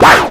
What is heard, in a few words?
Synths C; Vengeance Samples